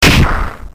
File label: fire